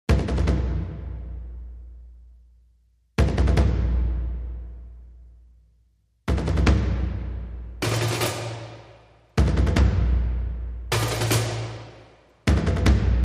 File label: Mid Drums Only